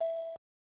call connect short; LG LV7500 Whistle

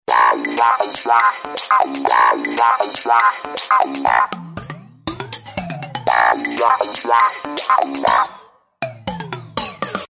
Wahwah, phone tones; LG GB190

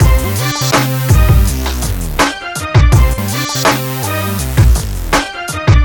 hiphop, ringtones; Google; NewWave Labs

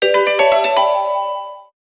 Alert; PlusFourSix; Patti; Lars Edvall

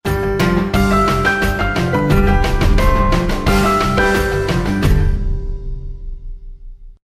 Ringtone, BlackBerry 9000 Bold

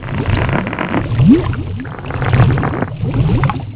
plant tunnel rotate; Bounce Touch

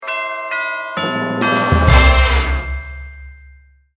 Christmas Bells, ringtones

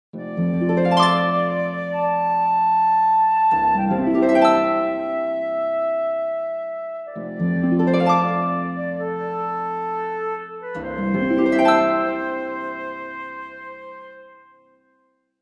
Orchestral clock alert

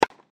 bounce

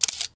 camera click

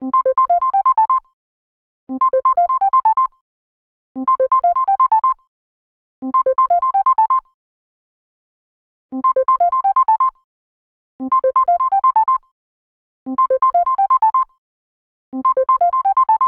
Simple Bell, ringtones

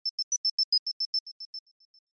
Lightning bug

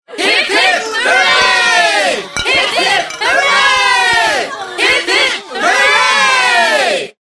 hipp hipp hurray, Greetings; Arphiola ringtones

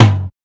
drumset, drums

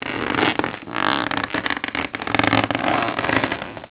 plant grow; Bounce Touch

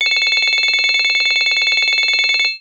Generic Ring; Samsung SGH-i600

